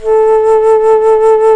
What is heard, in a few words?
FLT, Bass Flute, BsFlt vib